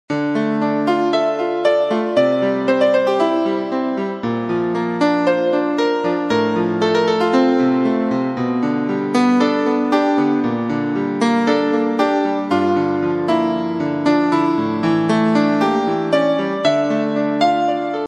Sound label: movie melody drop; Sottofondi